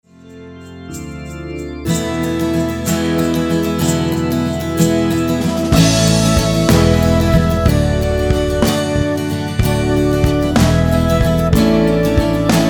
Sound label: Responsorial Psalm instrumental; for Christmas Day Today Our Saviour Is Born